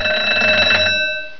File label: phone ring